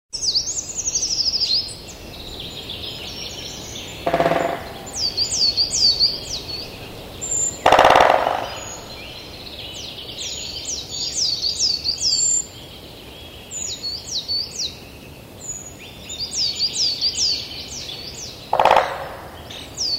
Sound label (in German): Buntspecht